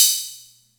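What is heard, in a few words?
Atlantex Music Percussion Computer hi-hat closed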